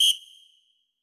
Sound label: WHISTLE, Drums; Yamaha SY85